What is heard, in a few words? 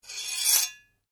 Blade Scrape Slow, Sword; Foley sounds for melee weapons; Swords; Combat